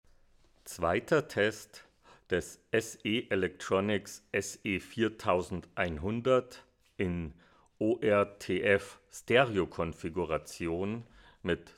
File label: sE4100 ORTF